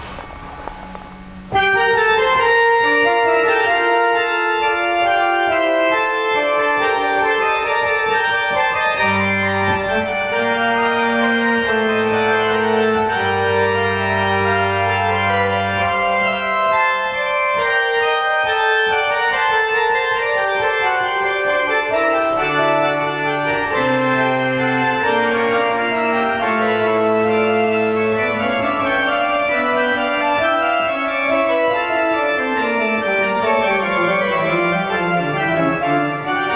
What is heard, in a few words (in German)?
Tutti